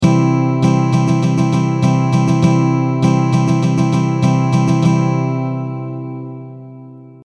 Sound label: stroke c, guitar